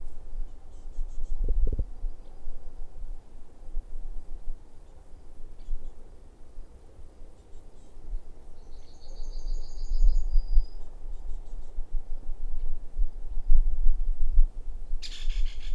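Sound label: ptak, bird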